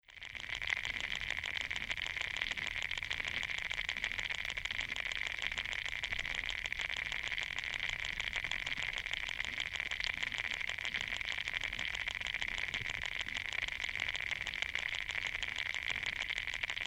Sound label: Eelgrass Padilla Bay